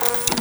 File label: ambience disaster ignite